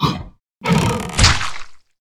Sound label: boss death; boss mono